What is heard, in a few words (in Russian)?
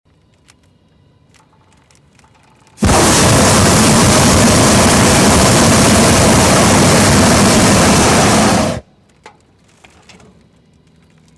zvuk poleta